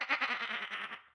Sheep

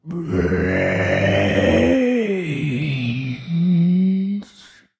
Grunt

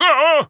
Wizard Pain

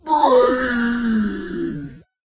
Future Groan

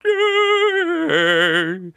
Sprint Loop

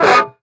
Smash

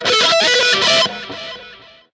Guitar

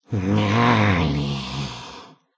Beach Groan

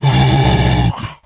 Dino Groan

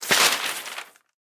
paper rip

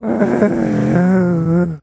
Beach Groan

